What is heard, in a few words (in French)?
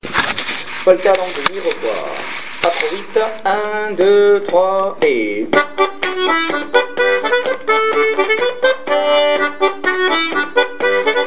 Polka; de Mirepoix